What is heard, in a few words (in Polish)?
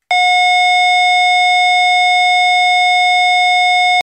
ton